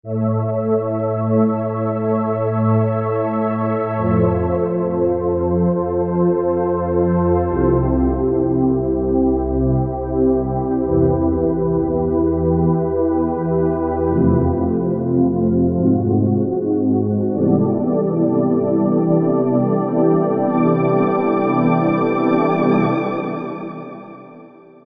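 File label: Pad Warmer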